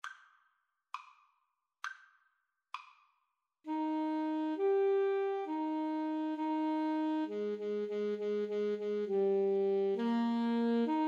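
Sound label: Alto Saxophone Duet, karaoke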